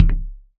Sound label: glass, footsteps; player; Dystopia